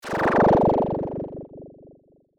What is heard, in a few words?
swipe; tyrants edge